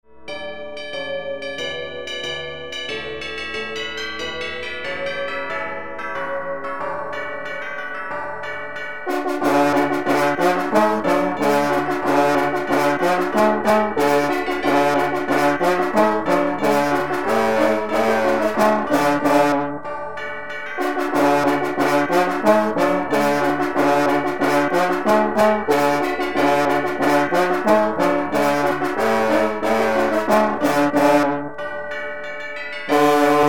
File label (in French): basse canal droit